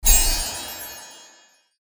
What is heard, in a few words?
UI Upgrade